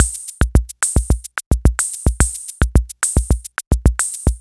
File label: Bossa Nova A